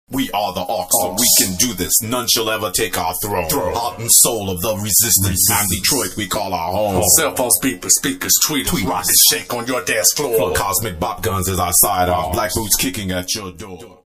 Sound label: Acapella